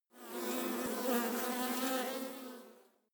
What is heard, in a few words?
bee buzz